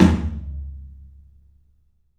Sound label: TOM, 3D Percussives; E-MU Producer Series Vol. 5 – 3-D Audio Collection; PA Toms